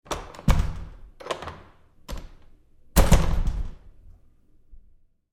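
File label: Door Bathroom Stall Handling Close Up Med Short; Big Room Sound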